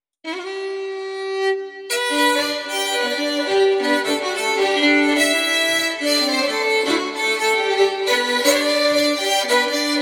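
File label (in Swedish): Polska, säckpipa; Maja i Löcka; efter Ernst Pettersson Brattfors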